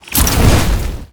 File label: explosive barrels